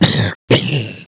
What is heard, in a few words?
zombie pain; svencoop; hunger; hungerzombiecop